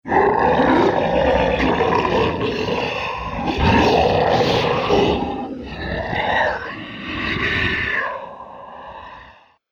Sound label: Dinosaur Horde; animal; Dinosaur Sound Effects